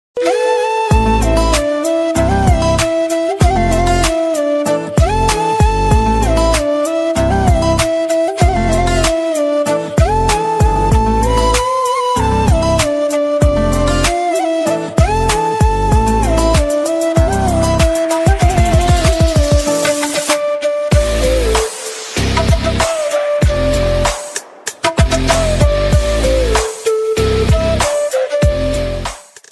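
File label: flute version sad ringtone mood off ringtone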